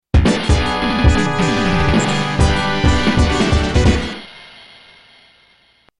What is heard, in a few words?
Music Victory